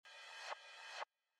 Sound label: pop up